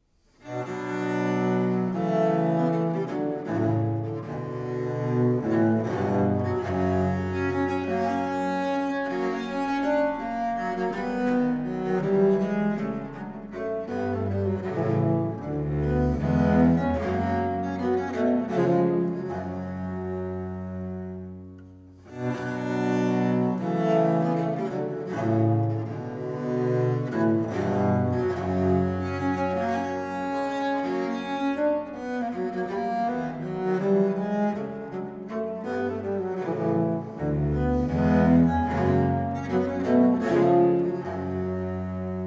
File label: duo for two bass viols, in c major minor